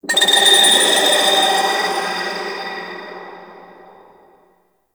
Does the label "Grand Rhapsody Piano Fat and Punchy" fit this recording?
No